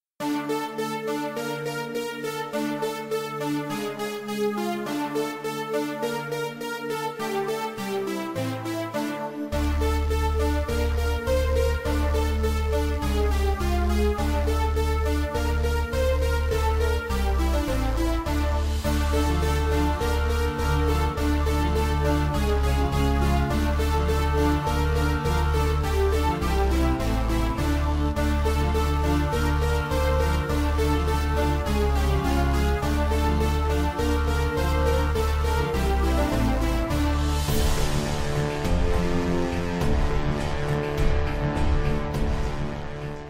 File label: Futuristic Music